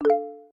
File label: message